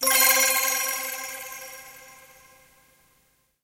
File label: Goal Reached; Atelier